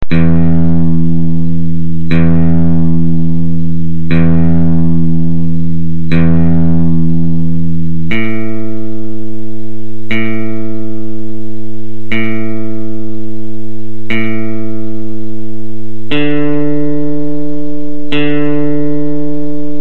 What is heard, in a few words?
tuning